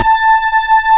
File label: LED, SYNTHESIZER, DIGI LEAD; AKAI S6000 CD-ROM - Volume 1